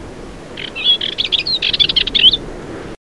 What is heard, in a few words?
Sylvia undata; Fauvette pitchou